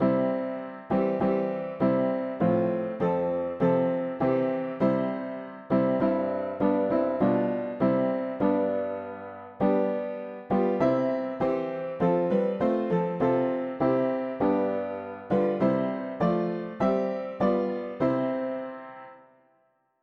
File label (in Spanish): mono piano; hymns; Himnario pequeno